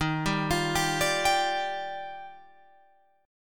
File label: Eb Major 9th, Guitar Open F; EbM9 arpeggiated; 15,14,17,14,17,14; JGuitar